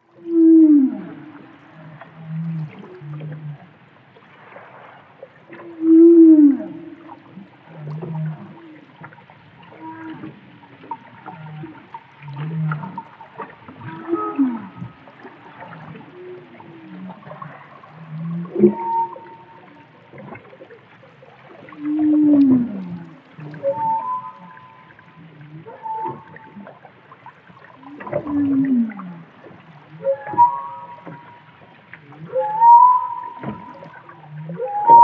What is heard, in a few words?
Humpback Whales